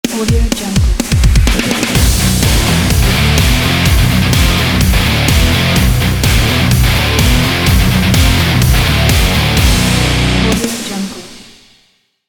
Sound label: metallic logo